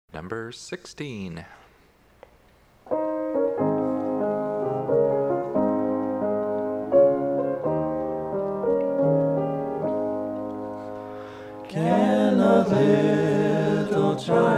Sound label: Hymn